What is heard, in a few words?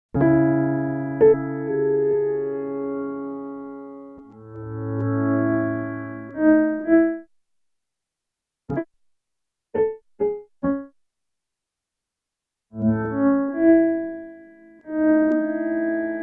EHX Pico Attack Decay Piano